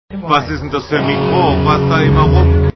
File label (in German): micro brummt